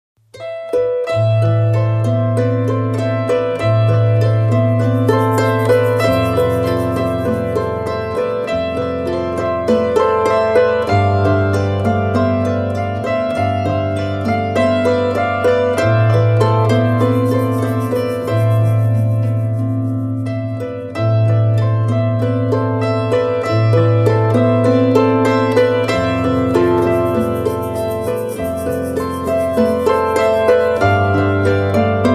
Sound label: No Percussion